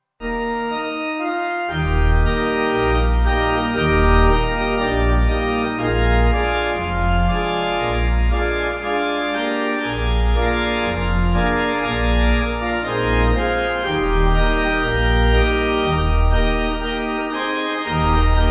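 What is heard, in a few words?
Pipe, Pipe Organ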